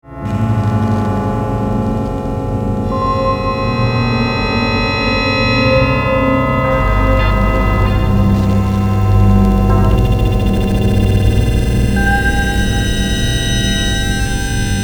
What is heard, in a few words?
synclavier